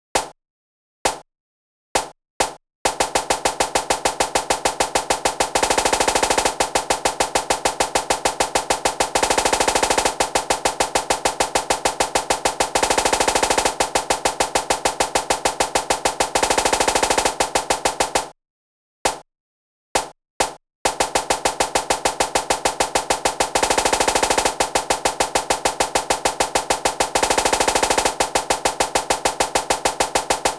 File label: Snare; Magic 6s Snare UTG